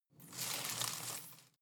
home plant leaves rustle regular

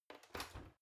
oven open soft

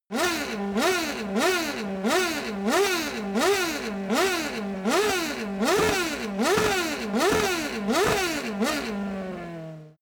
racing car f1 rev slow close

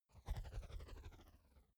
fly by alien hover

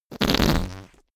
whoopee cushion fart long

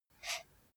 computer mouse draw short

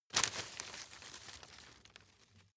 grab rustle medium

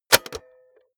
cassette player slide tray insert hard

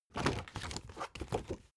spring binder open

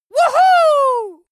dwarf cheer "wuhu"

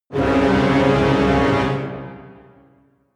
jingle brass and strings lurking deep

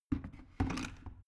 plastic water boiler place soft

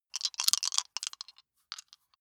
big three rattle in hand closed

